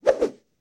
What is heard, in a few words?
Arm Whoosh Multiple, Hand To Hand Combat; Sony Pictures Sound Effects Series